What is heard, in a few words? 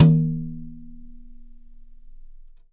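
Yamaha 8X10, brush, drums; Big Fish Audio; Ross Garfield Drums VOL-2; T-YAM BRUSH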